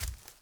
Walk Veg, Footsteps; Characters; Enemy; Mockeries

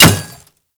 Bullet Impact Metal No Whizz

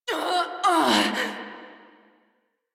Death, Female; Railjack Crew; New Loka

